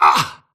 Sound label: grunt hits; Titanfall 2